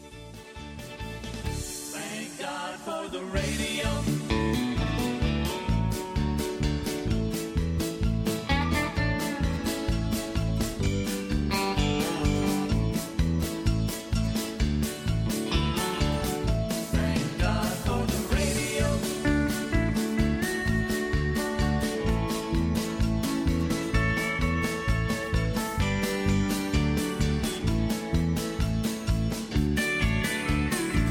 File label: Instrumental